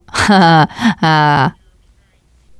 laughter, teen girl

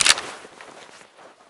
auto shotgun bolt back; weapons, gunother

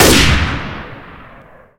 hunting rifle fire; weapons; gunfire

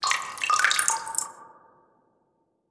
random drips

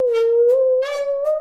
saw danger, music, zombat

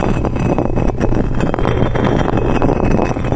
rock scrape rough loop; physics, concrete; Left 4 Dead 2; Left4dead 2 2013

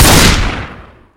shotgun fire, shotgun chrome; weapons

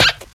concrete, footsteps; clown

